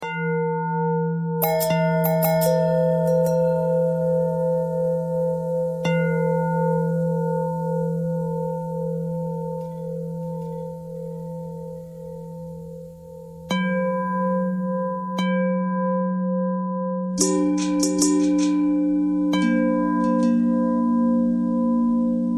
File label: long bell bell thread noise; bells